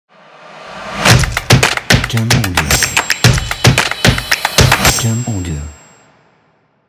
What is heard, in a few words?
Action Stomp Logo Ident